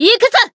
Voice attack jp

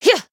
Voice attack; suzette

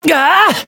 Voice attack; curio

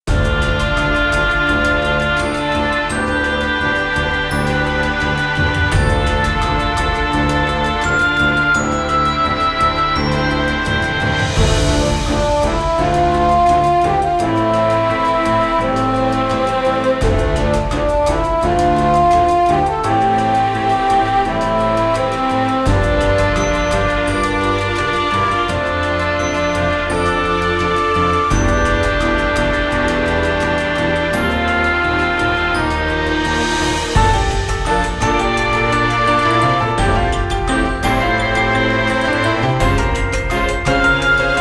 bgm field steam mountain

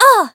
damaged; alma

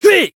Voice attack; shigure

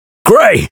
Voice attack; hardy

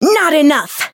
damaged; sherinu

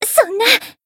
Voice damaged

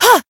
Voice attack; isuka

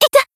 Voice damaged jp; hoozuki

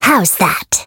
Voice attack

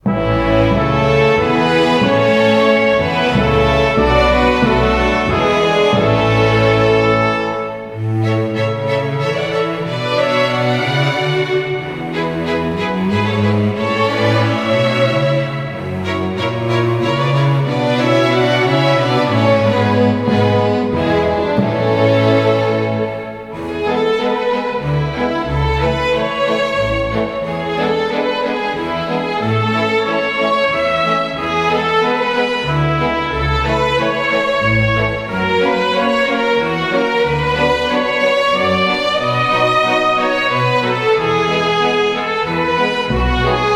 Bgm town; coclea

